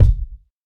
reverb bass drum sample g key, kick drum single hit tuned to the G note